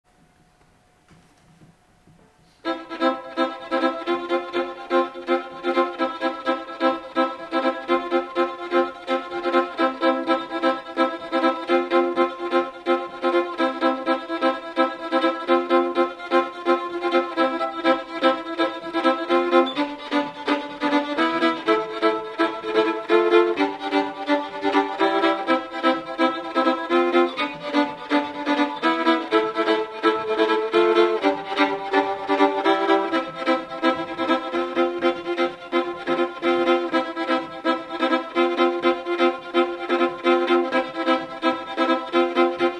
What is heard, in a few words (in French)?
Polka 2 - 1er accompagnement, violon